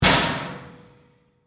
Locker Punch, Sports, Locker, Door, Fist, Punch, Slam, Locker, Room; Miscellaneous Sport; BlastWave